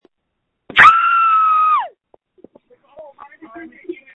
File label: Scream